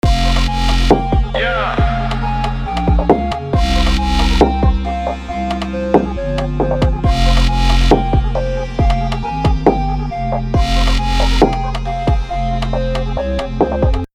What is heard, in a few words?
drill; לפני מיקס